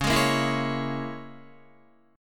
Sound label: C sharp Minor 6th C sharp, Guitar; C#m6 strummed